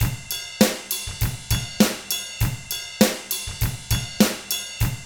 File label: ROCK, Drums; ILIO; Double Platinum Drums 1